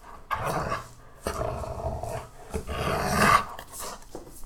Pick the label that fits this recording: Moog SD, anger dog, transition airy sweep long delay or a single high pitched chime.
anger dog